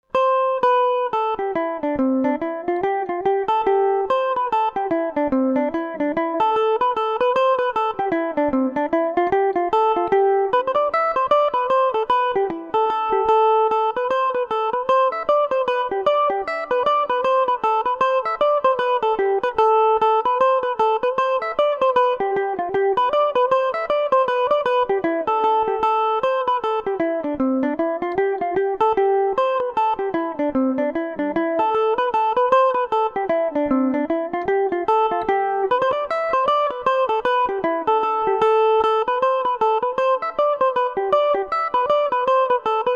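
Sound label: A minor revelation, Irish mandolin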